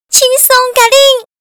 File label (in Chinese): 女胜利; renlei